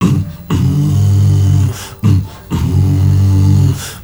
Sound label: MALE HUM; Spectrasonics; Heart of Africa